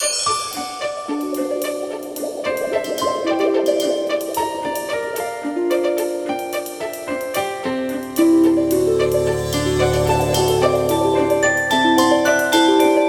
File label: Fish Tank